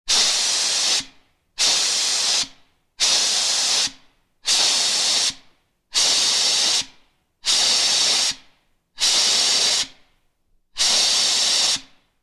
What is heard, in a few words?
Medium Air Blasts